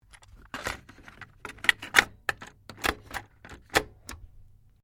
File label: Stereo Akai Mini Tape Deck Load Cassette; Multi function music player; Tape Players